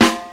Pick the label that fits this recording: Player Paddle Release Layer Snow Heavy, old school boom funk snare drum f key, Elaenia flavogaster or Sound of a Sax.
old school boom funk snare drum f key